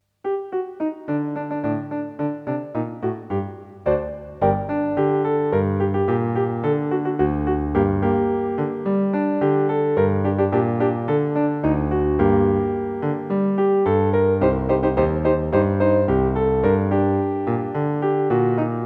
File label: Dream Beat